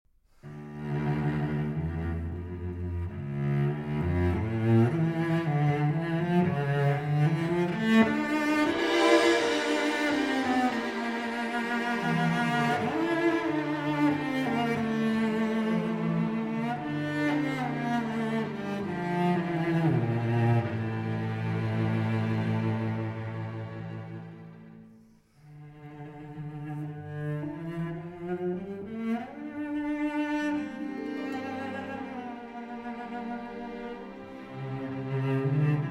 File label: Cello and Orchestra